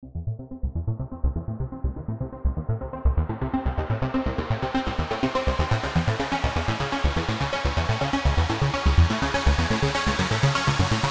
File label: Arpeggio Synth (High wash)